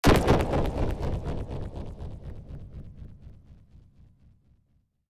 Alien Com; Resurgence

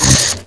hit; sword of sadism

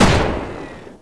goliath gun

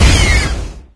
blow up; probe

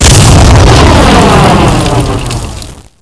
bfg fire

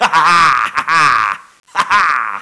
laughter

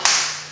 miss; saitama

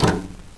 player ladder

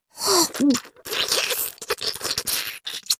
gonome eat

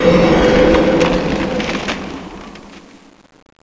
freeze

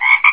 ribbit

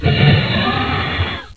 roar

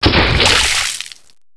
mortar hit; tubequeen

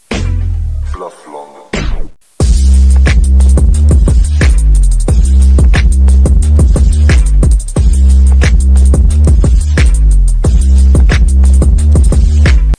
drip car

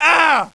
get hit; eqclassic

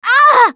die; rochelle; l4d

